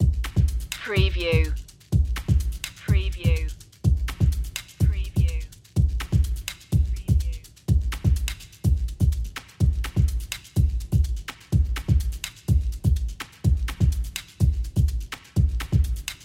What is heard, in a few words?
erased loop